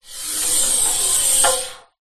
metal pole slide; foley

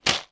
food chocolates multipack catch in hand; 4; smarties; zapsplat

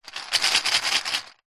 leisure game plastic discs shake in plastic tray; connect 4

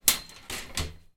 household ironing board unfold put up

